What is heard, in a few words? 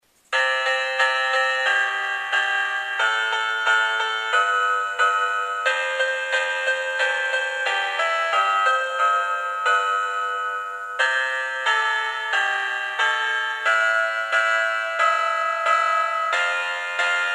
Face Scratch